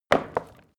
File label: wood drop indoors